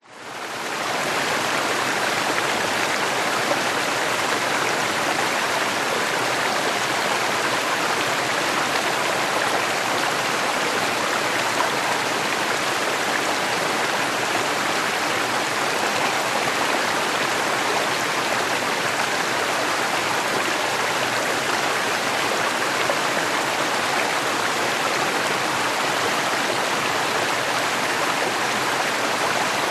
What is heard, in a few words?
creek flowing night doi suthep national park thailand